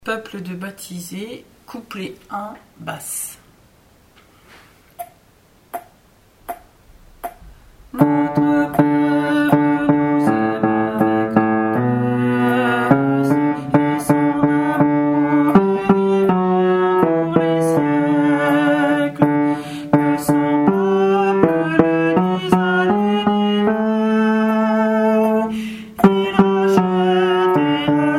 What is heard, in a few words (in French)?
COUPLET BASSE; Peuple de baptisés